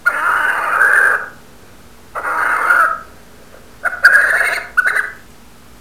Blaireau Gemissement

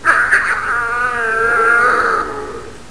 Blaireau Feulement

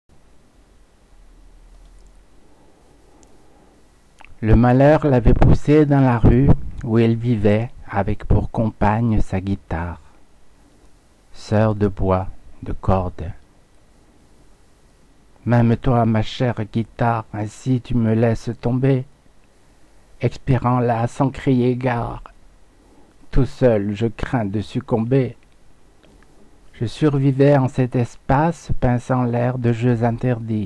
cordes

